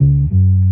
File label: two bass notes, Stabs